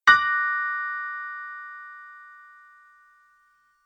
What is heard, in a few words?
realization creepy; yttd